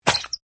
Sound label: Damage